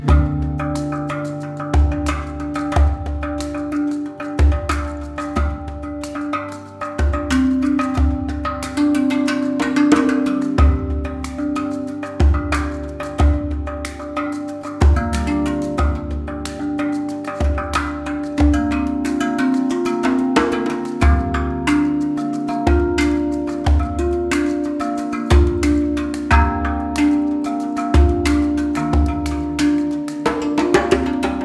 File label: DJEMBE